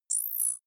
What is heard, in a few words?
zoom in; signale